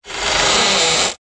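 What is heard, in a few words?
Door Creaks High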